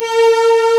STRG D4; East West; Global Sounds

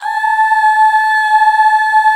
UUHH A5 L, Hallelujah, BACKGROUND; Best Service

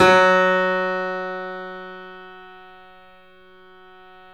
F#, 3-D Audio Collection, 3D Pianos, Yamaha C7

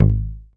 YAMAHA5 MUTE G#1, Bass

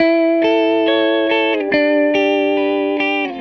Les Guitar Loop

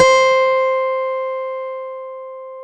AC GUIT C4, AC.GUITAR

